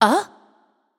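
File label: surprised, non-verbal; smite; voicepack; tiamat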